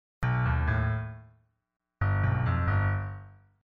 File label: dry piano bass